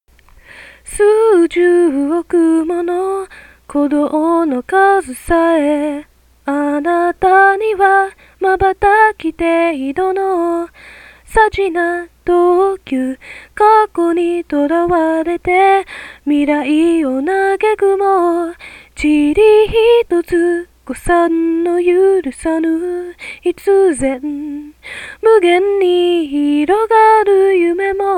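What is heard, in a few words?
Singing, Japanese, anime song, female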